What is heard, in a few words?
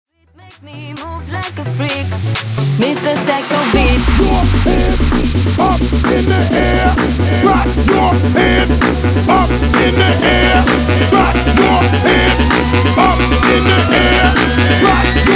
tribal remix 130 bpm; tribal bootleg remix